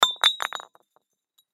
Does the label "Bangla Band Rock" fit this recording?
No